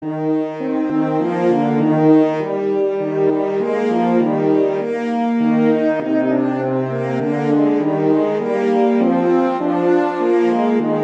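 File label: Duet, horn; Mozart; Duets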